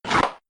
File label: coffee slide left